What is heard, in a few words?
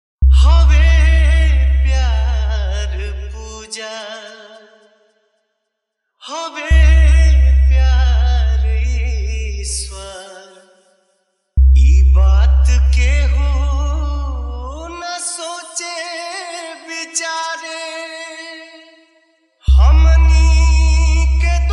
Hard Jhan Jhan Bass Sijan Mix Dj Song